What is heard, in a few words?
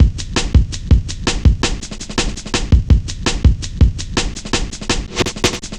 Cut Thru B, Drum Loops, Jungle, 165.5; Zero-G Jungle Warfare 1, 2 & 3